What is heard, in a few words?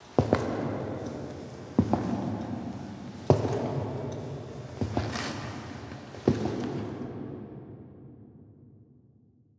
Ominous Steps